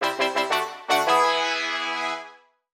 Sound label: multimedia game sound synth brass fanfare success musical; captain pegleg